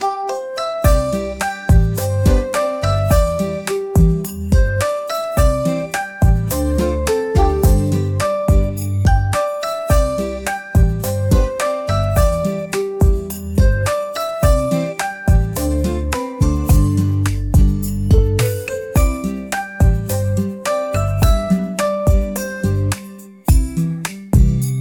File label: morning bright